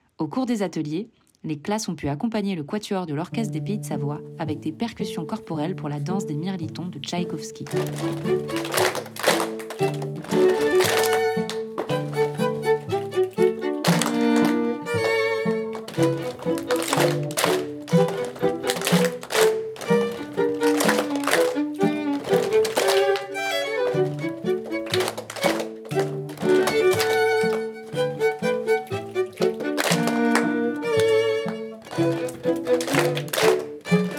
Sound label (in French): Percussions Version avec voix; kezaco